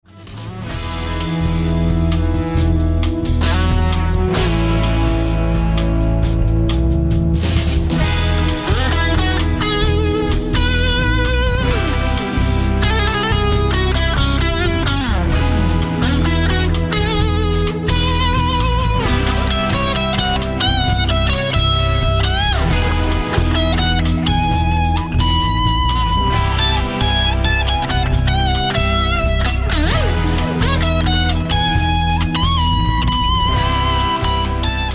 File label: jazz jam